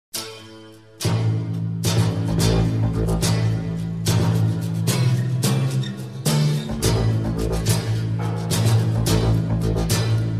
battle guitar part; robin hood

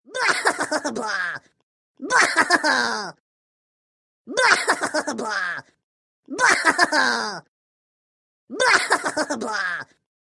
rabbids laughing; the raving rabbids

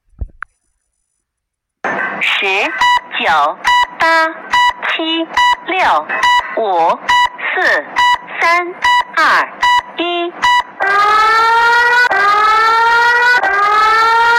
china eas warning sound

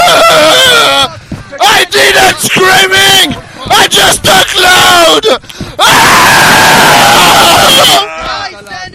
screaming

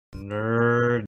techno left

